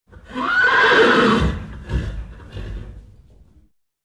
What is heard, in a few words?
Horse Bray with breathing